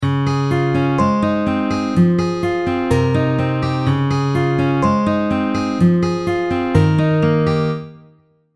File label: Ab 125, House & Deep House MIDI Melody; Shower